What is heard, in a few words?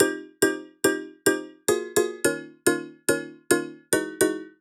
Instrument Layer